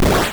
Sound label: kill